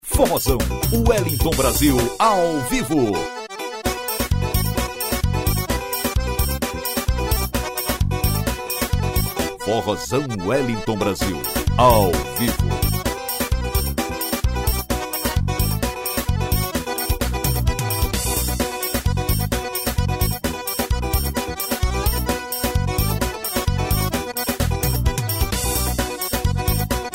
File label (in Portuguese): forrozao